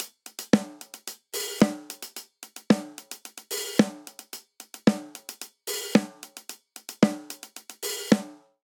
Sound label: Classic Disco Snare & Hihat 111 bpm; 4 4; MIDI Clips; Ableton; Factory Packs; Drum Booth